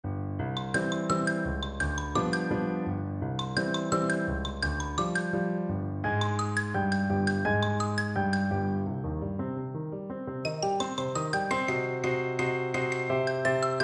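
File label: rag xylophone, percussion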